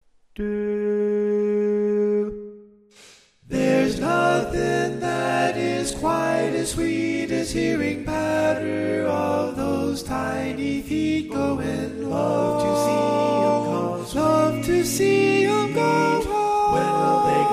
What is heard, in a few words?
Tenor